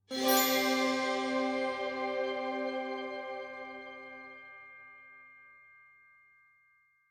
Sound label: Swamp Ram Win; Ori and the Blind Forest; Thornfelt